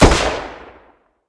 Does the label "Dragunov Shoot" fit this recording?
Yes